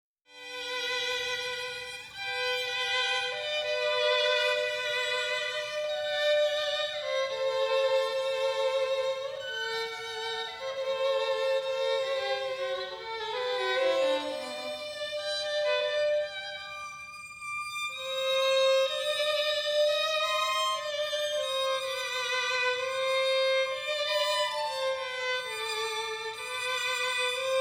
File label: 2 Violins; O Sole Mio